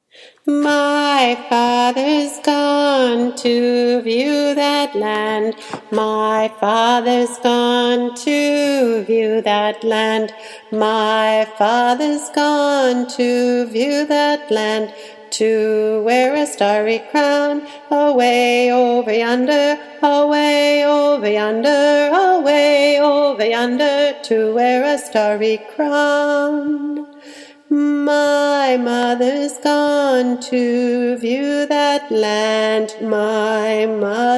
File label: bass